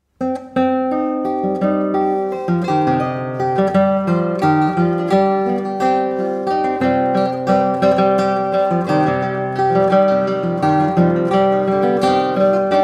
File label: 3 guitars